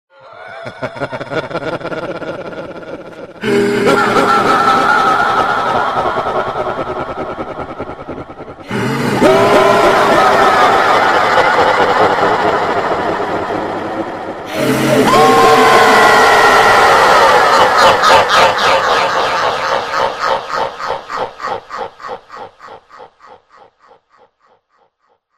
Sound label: laugh; Majora’s Laugh